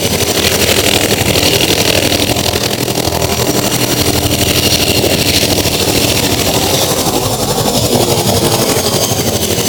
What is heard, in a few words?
Archwing movement engine loop; Warframe